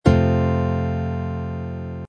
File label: f major barre chord, guitar